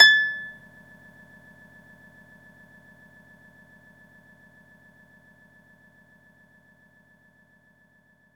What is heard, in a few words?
Orchestral Harp A6